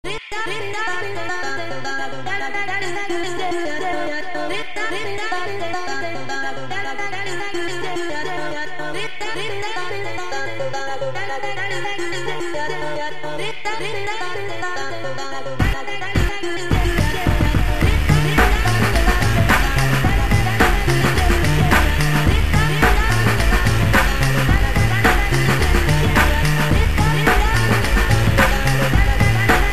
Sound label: polka remix